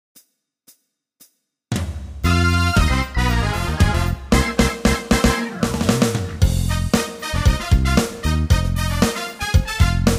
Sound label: Styl R&Blues